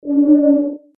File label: Laser Radar Sound, science fiction sound effect; star wars / trek style games